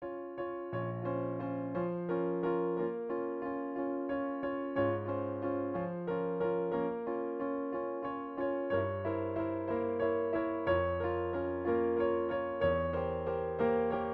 Prelude - piano; Hello Charlotte Requiem Aeternam Deo

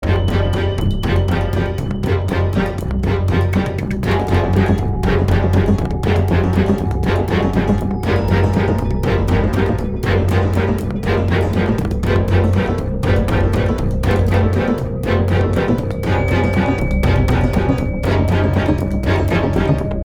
Action Loop

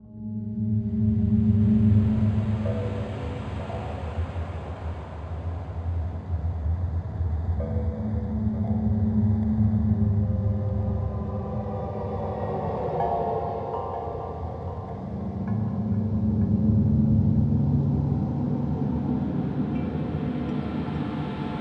Amb; Amnesia: The Dark Descent

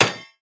Tnt Box Frame Break; Angry Birds Rio

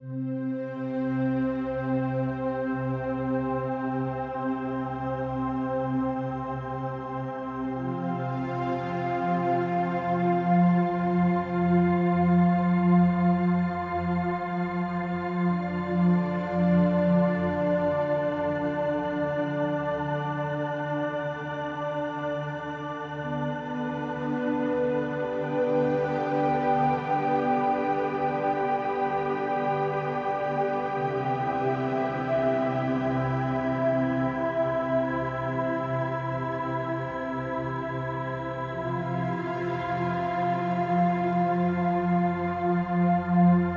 Dialogue Neutral; Miraculous Rise of the Sphinx

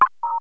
cutise

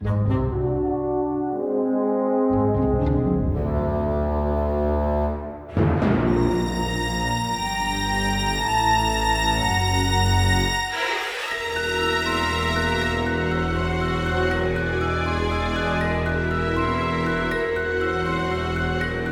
Suspense Music

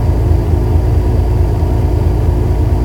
Toyota Gt86 Low; car simulator 2

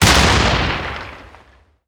Tnt Box Explosion; Angry Birds Rio

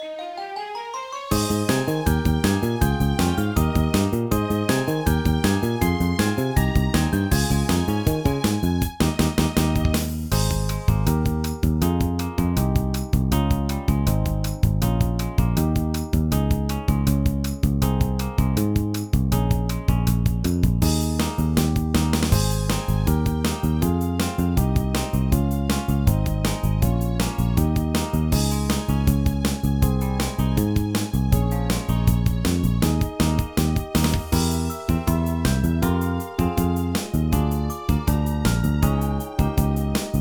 Video Game Stage Karaoke; pinky street kira kira music hour pop princess gamerip

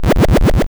Orb Spit; gloom 2017 gamerip

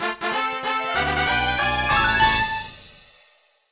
Minigame Won

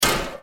Drop Metal Door; Granny 3; android mobile gamerip 2021

